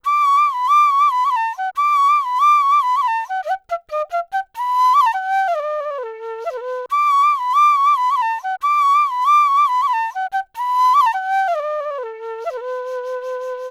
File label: Ember Flute Loop B; Bihu; waves of bihu vol 2; Gully Loops